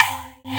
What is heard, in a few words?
PERC FX